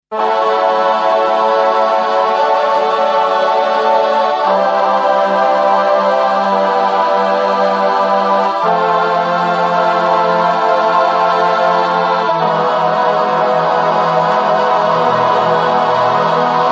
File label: choir